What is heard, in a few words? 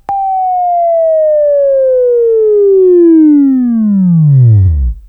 Buzz, Sweeping Training